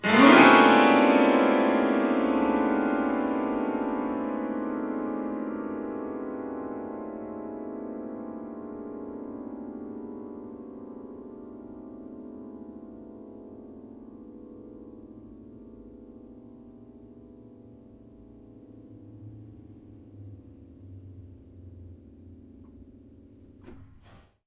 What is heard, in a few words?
String Brush, piano; Piano Miscellaneous